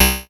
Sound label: METAX C2; Q Up Arts; Dream Experience